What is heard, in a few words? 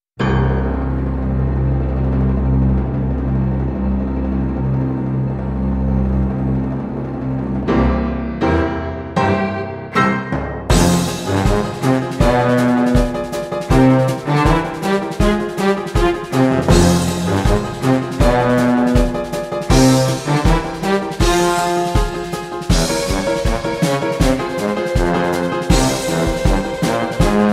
Magpie Dance